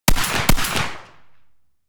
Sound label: spas 12 12g solid slug 1m right clean double shots; Sonniss GDC